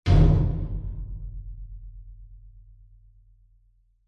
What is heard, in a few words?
impacts metal medium hit resonate lightly rattle